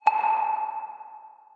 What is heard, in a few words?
chime